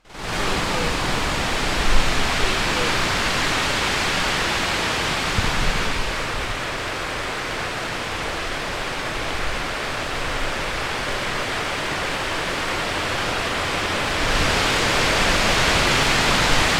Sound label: Wind in Trees